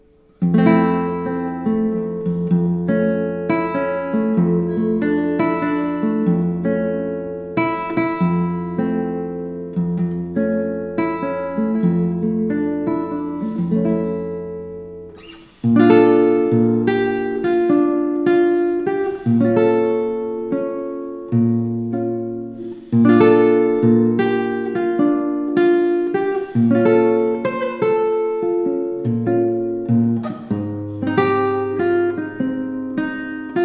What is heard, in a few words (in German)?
Gitarre Solo